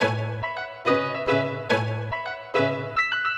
chops

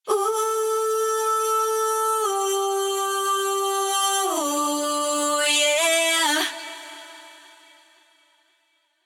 Am 115 BVs loop Ooh Yeah

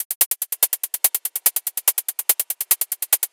Hi Hat Loop 144; Groover

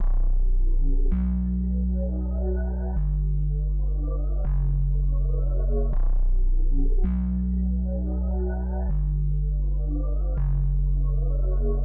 162bpm bass; Trench Baby Vol 5

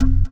Fm Push Bass

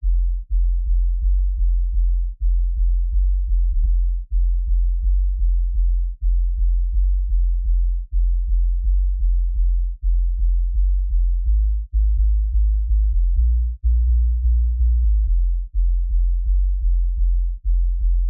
Synth Sub E 126bpm

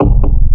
Wavetable

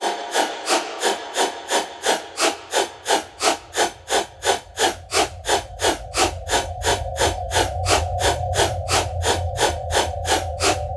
DNB Song Starter 175; KULTURE

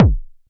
Synth Kick

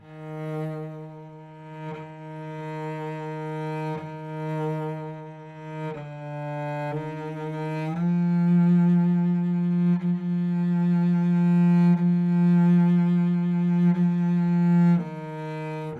Cello, 120bpm, C, dark horror

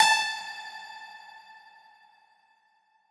Pluck A1

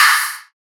clap release

